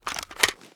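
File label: assault rifle reload rattle; viper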